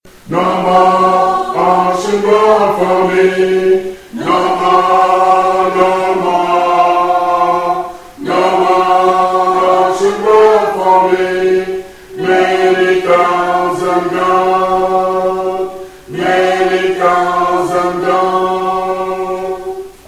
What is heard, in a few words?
No more auction block alto